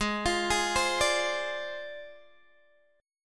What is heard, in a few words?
G sharp 6th Flat 5th G sharp Guitar DADGAD